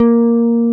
BASS; Sonic Reality; American Heartland

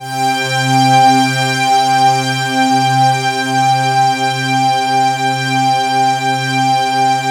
pad C3; Jungle; USB Soundscan vol.05; Explosive Jungle; AKAI; 90s sample CD

